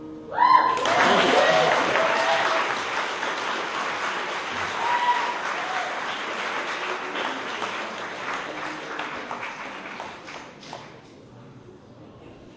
short but real applause; Sound FX Real Life

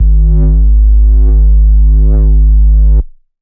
synth bass gentle wobble; multisampled; synth keys

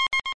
three beep stereo; Weird and Interesting Hits; single hits

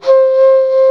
flute c2; Flutes

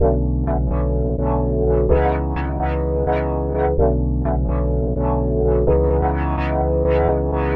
asleep sweep C, keys organ piano loops

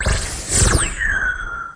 filtersquirt; Weird and Interesting Hits; single hits

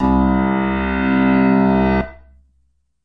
Piano C2, backwards piano

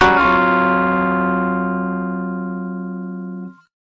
rhodes hit; single samples

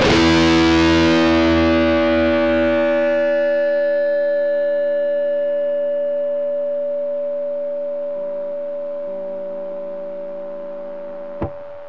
guitar drone into feedback

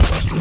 fx drum

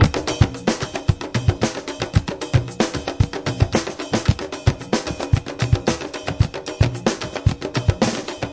authentic kit, 112 bpm

